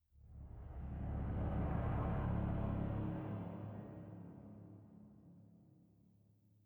sting metal groan; Rust

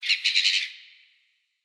ambient sea birds

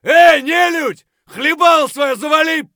fight; Escape from Tarkov

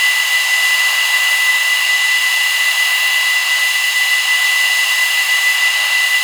Turbine Hyperbar; DCS

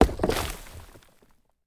planter deploy; Rust

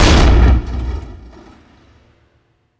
Hit Med In, Damage; DCS

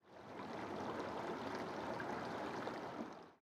ambient battle; World Of Warships

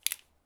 item chemlight on; Escape from Tarkov

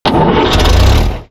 Vehicle Engines; Red Orchestra 41-45

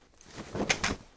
waterpipe shotgun holster; Rust

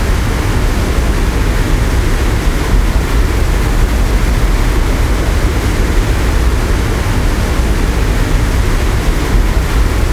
Aircrafts P-51D Plane Wind; DCS

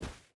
footstep hide snow run; Rust

